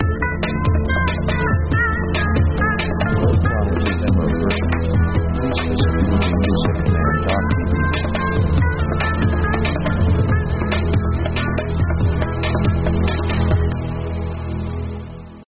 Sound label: oriental; synth themes